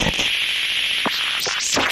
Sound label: Signal Interference Transition